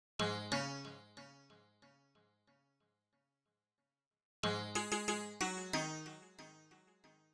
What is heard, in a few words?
pluck; player vst; sound selection kits